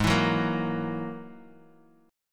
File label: G sharp 7th Suspended 2nd Sharp 5th G sharp, down, Bass Standard; strummed; chord